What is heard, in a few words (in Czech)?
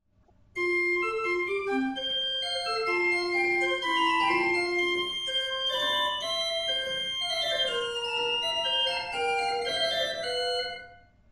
ukazky positiv; hudba